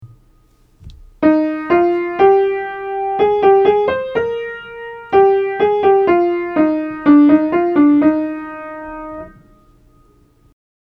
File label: Embellishing Tones Aural Training exercise